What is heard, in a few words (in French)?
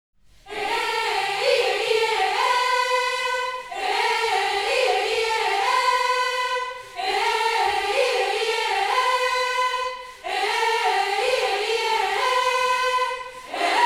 Polyphonie du Cameroun